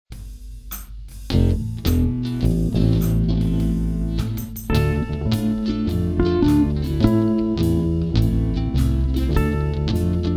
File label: Blues Rain